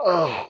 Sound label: dolg death; STALKER; customnpcs